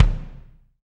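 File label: bass drum, Roland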